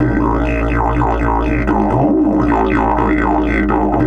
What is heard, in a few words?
120, Didgeridoo; Didgeridoo and Other Primitive Instruments; 120BPM